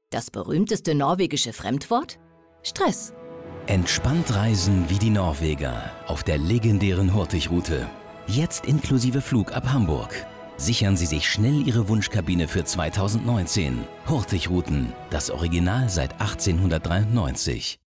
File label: Funk; Hurtigruten Fremdwort